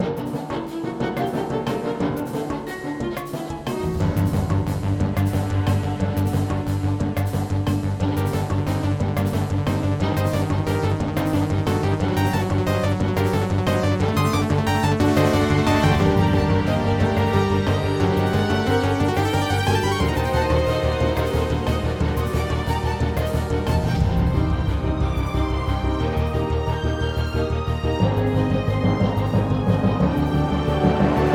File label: Combat Theme; windscape switch windows xbox one gamerip 2016